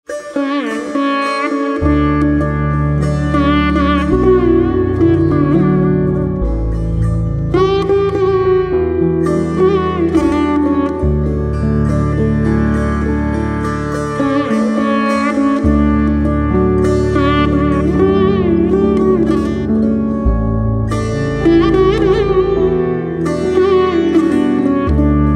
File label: Veena